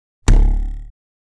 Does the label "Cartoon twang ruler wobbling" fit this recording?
Yes